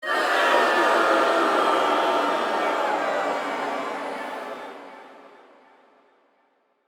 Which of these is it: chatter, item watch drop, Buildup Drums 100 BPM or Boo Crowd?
Boo Crowd